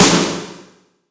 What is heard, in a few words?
80s Drum Snare; Yamaha PSR E305